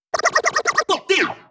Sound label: Vocal Scratches 140BPM; Vengeance - Essential Clubsounds Vol.4